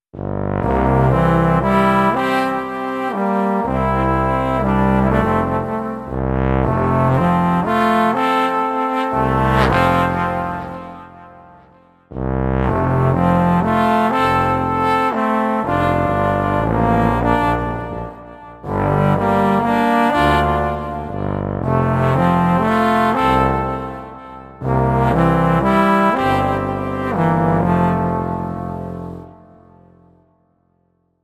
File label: Alpine Echoes